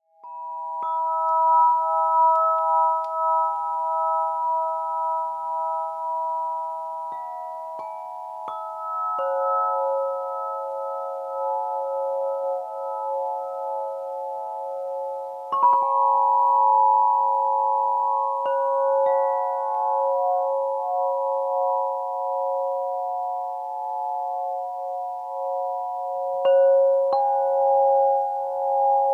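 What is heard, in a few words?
Earth Om C sharp Lightness, crystal harp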